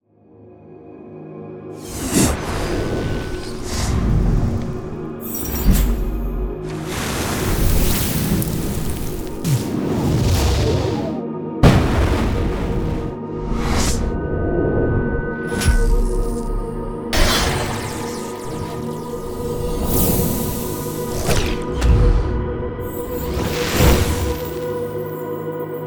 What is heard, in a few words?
essential magic sound; essential magic sound pack